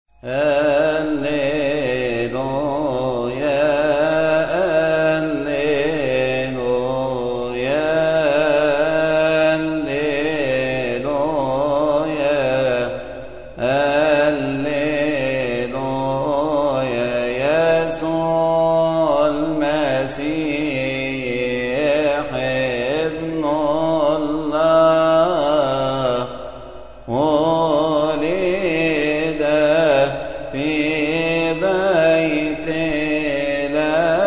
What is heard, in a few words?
marad el engil kodas milad arabic; madraset el shamamsa